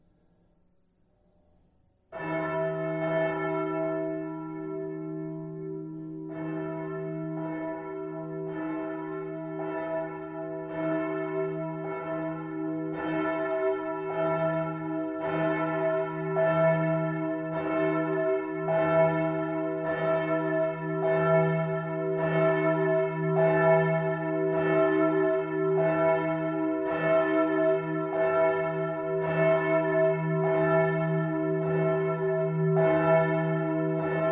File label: bell southtower; 03 christophorus